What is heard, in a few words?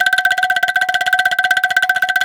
ANGKLUNG F3; Spectrasonics - Heart of Asia CD2